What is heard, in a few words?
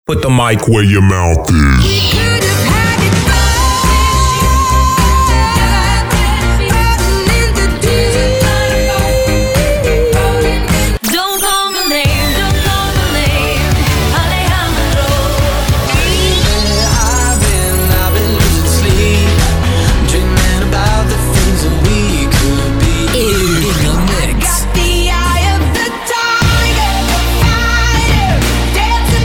Music Mix Jingle